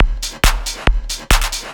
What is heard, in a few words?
drm 138, techno drum loops